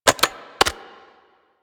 Arch Gun Alt Charge Mech, Weapons; Warframe; Archwing; Hildryn

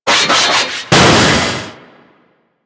Foley Locker Open Rip; Enemies; Kubrow; Warframe

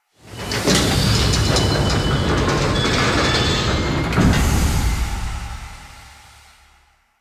Cryopod Rise; Entrati Labs; Loid

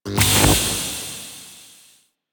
Tesla Coil Activate; Ambience; Warframe; Corpus Gas City; Gameplay Remaster

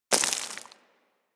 Bullet Impact Debris Dirt; Warframe; Weapons

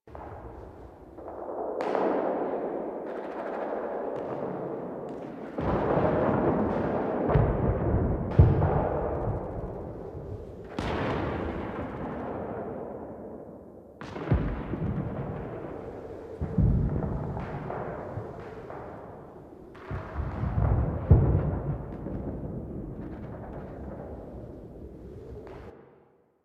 Battle Loop; Warframe; Quests; TheNewWar; Ambience; Cetus; Unum Tower; Cetus Start

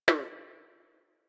Forest Frog Close; Warframe; Grineer Forest

